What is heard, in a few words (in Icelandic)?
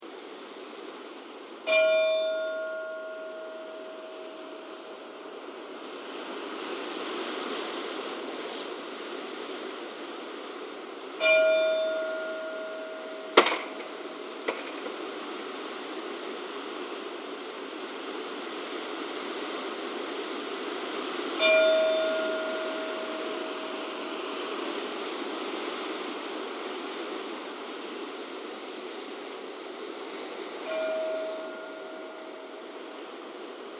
stadarkirkja likhringing; Staðarkirkja á Reykjanesi; Staðarkirkja á Reykjanesi stendur rétt norðan við Reykhóla; Kirkjan var reist árið 1864